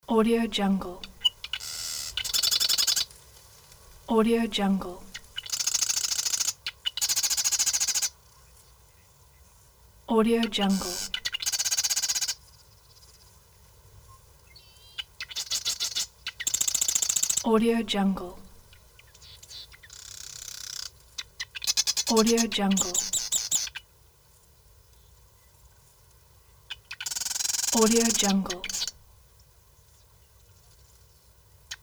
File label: birds